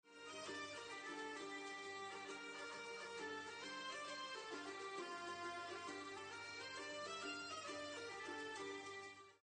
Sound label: german bagpipe music; mindbuilders